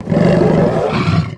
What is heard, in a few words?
attack